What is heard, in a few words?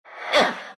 pain; dota; death prophet